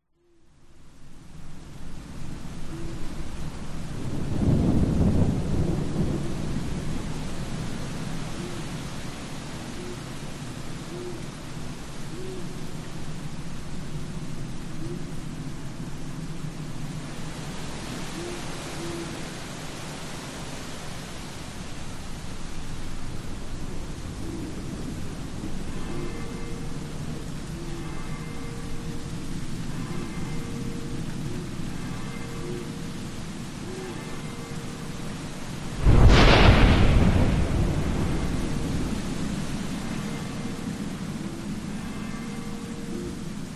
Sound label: horror graveyard ambience night wind rain thunderstorm church bells owl